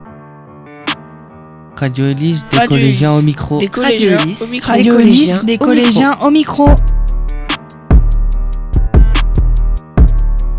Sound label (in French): Jingle Radio